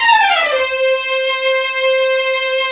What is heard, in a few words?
STR DWN, Vlns Bow FX, Runs; Roland - String Master Series; Vls Runs